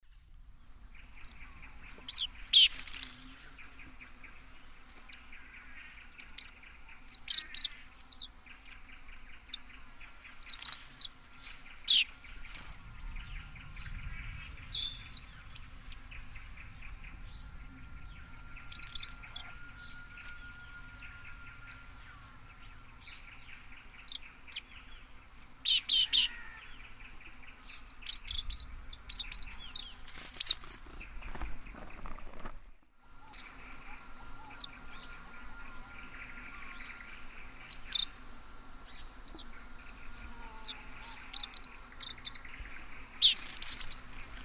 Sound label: Dacnis lineata, calls and song male; S. America; Eastern Lowlands